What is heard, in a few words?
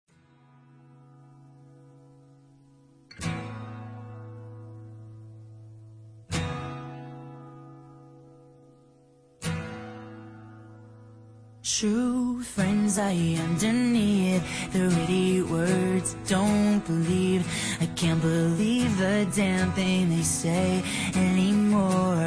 acoustic